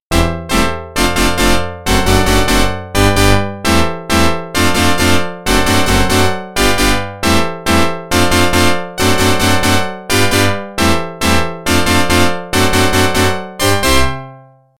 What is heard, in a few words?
Organ